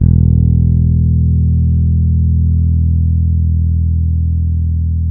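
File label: E 2, warm fretless, bass; Spectrasonics; Bass Legends CD 1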